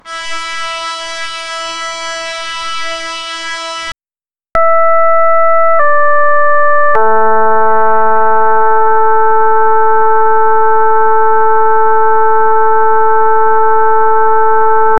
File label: beep